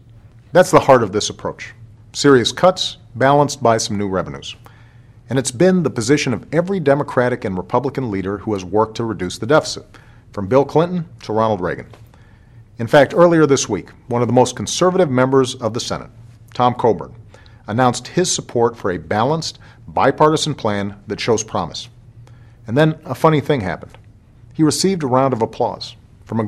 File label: applause; obama coburn